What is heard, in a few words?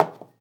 Wood hit, Foley; SFX; 7rystan's 600+ FREE EDM SAMPLES & DRUMS